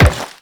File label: Step Metal, Footsteps; Characters; Warframe; The New War; Kahl